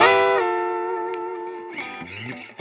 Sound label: Call And Response Guitar Licks, 70s Analog Funk; Sonic Foundry (Sony Creative Software); What it Is!